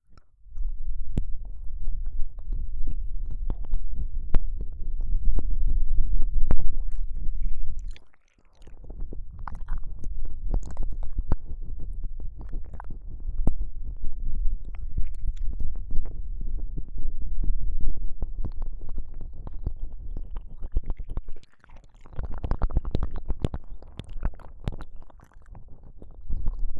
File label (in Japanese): 奥をひたすらゴシゴシ両耳